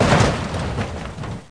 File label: HIT WALL; Andretti; INDY